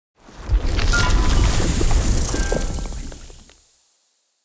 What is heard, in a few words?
Corrupted Sproutfolk Summon Cast; Enemy; Combat